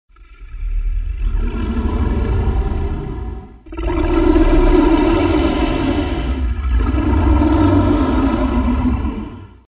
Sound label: Dragon breathing; Fire Breathing Dragons Wizards Fantasy